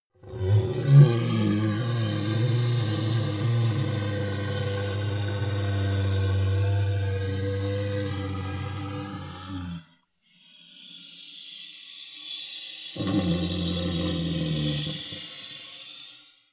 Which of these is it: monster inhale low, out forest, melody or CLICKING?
monster inhale low